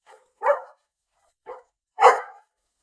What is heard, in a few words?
dog